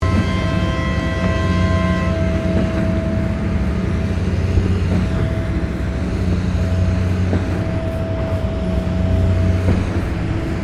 Ambience Construction Site Heavy Machinery, Industrial construction site; Construction Sound Effects; Foundation1